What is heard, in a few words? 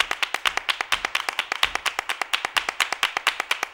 MULTCLAP, MULTICLAPS; Best Service; World Colours